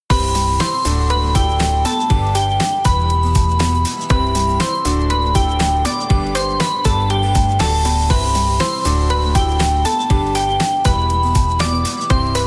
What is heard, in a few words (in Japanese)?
storyteller, 切ない